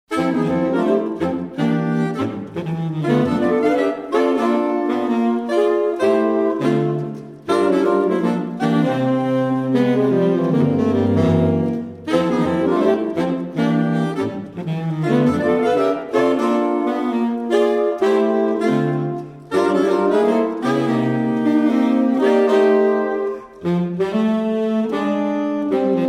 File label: For Saxophone Quartet; Four At Liberty